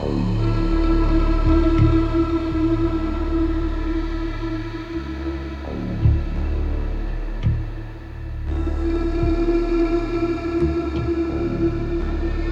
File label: loop; Eternity Pack; ZeroVR